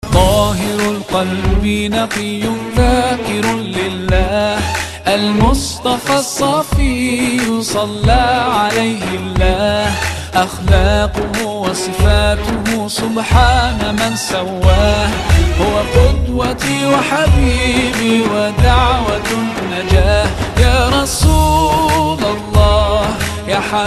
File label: (Arabic Version - Bonus Track)